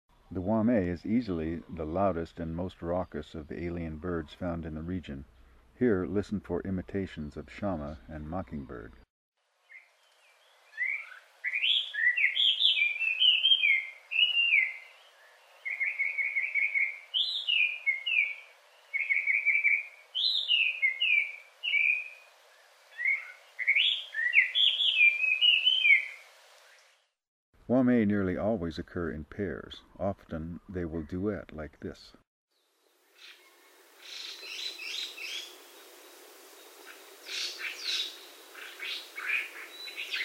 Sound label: Hwamei; Voices Kauai